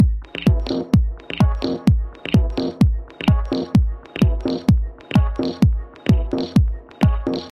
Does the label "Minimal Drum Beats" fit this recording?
Yes